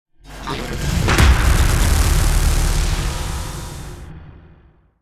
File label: Particle Cannon Cast; Starbites